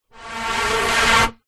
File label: air blow across top of metal pipe; zapsplat